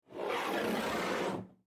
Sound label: Foley Glass Slide Across Countertop Right To Left; Kitchen